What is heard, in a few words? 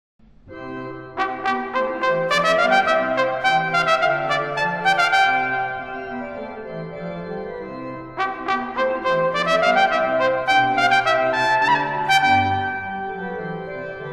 Allegro